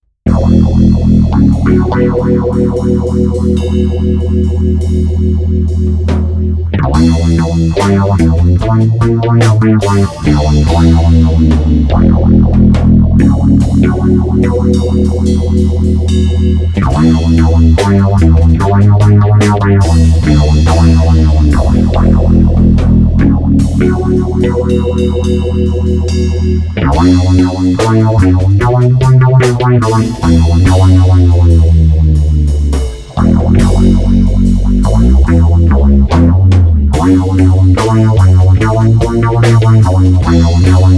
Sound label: me-20b patch; ME-20B Bank 4, No. 3; Laid-back sound featuring overdrive with phaser.